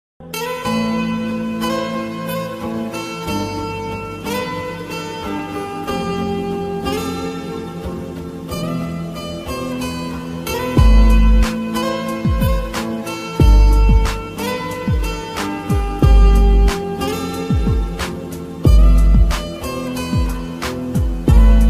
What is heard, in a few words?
instrumental music